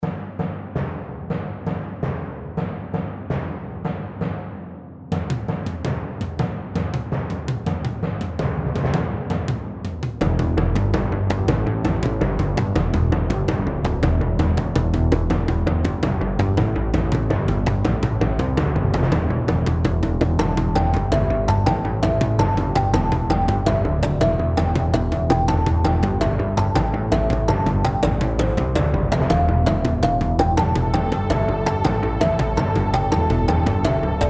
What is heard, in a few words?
Perc Layers